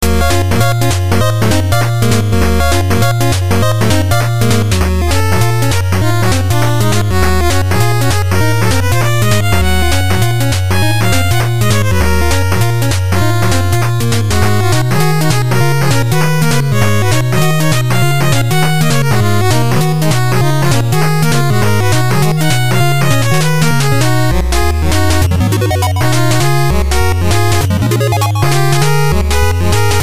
Battle; that brave per hesitation happyhoppyhappy; android

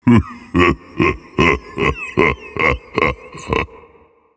Vocal Laughs; Minecraft Story Mode; Colossus

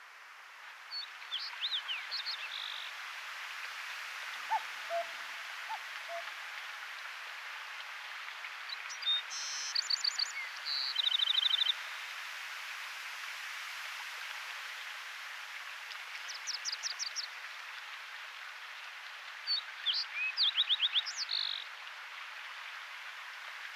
Garden Ambience; animal crossing happy home designer

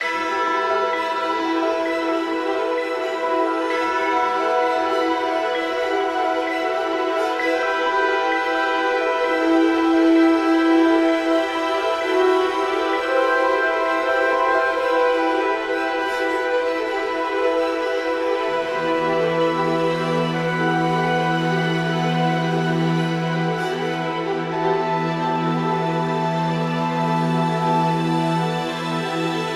Finale Explosion

